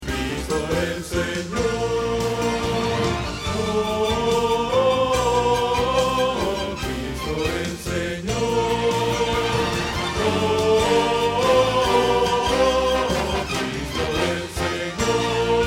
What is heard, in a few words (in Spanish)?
Bajo